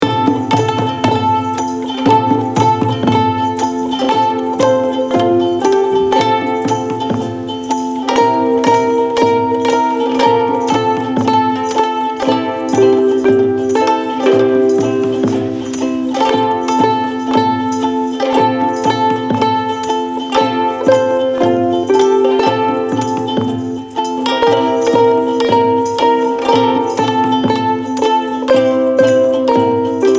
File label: jingle bells